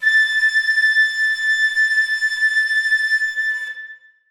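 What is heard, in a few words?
flutes sustain a3; Sonatina Symphonic Orchestra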